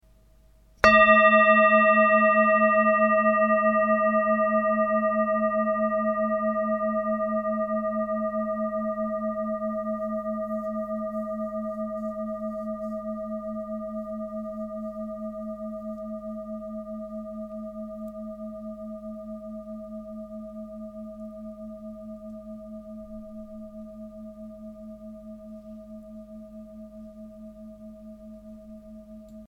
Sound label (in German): Klangschale